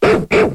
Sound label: Cry; Pokemon Essentials; Pokemon Iberia V1.03